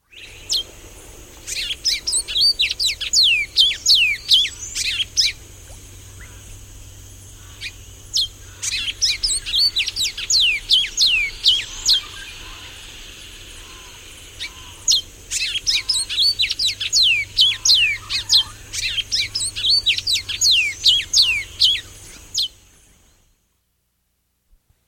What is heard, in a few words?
Pink-Billed Seed-Finch; S. America